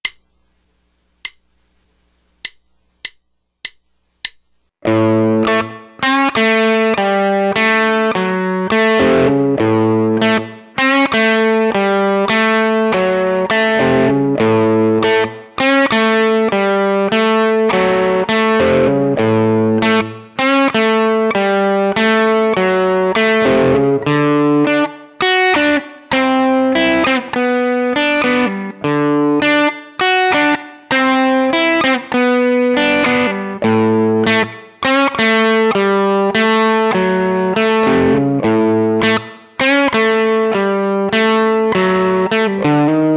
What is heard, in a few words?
Blues Riff in A 100bpm